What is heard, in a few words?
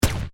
UI shot; Paradise